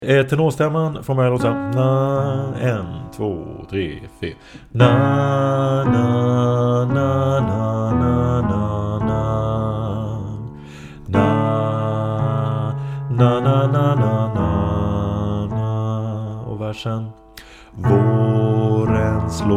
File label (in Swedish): tenor